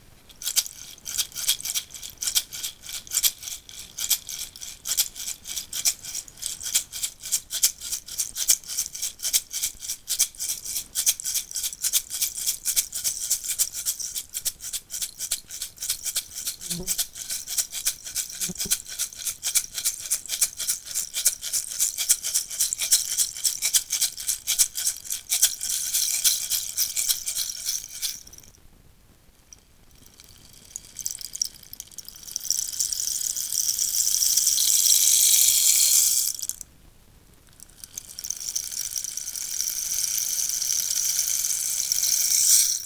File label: rainstick